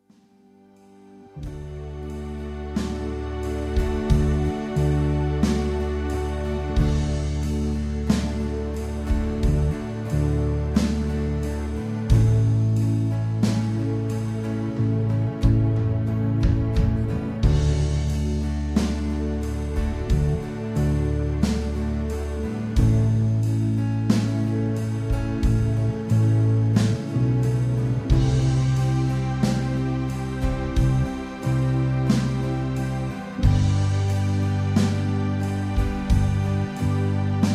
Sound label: backing track slow, guitar solo